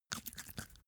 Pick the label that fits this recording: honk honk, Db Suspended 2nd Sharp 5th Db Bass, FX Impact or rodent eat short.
rodent eat short